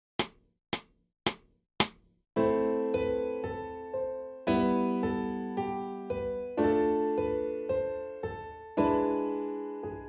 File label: a minor inversions